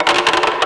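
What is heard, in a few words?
rhythm loops, 90 bpm; Rarefaction - Poke in The Ear With A Sharp Stick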